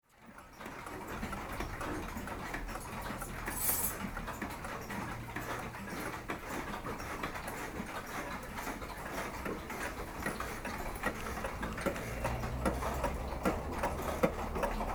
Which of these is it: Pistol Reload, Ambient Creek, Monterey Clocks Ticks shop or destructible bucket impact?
Monterey Clocks Ticks shop